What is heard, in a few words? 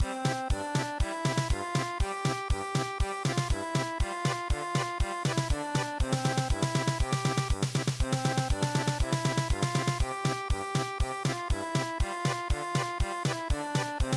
Polka German Polka; midi; traditional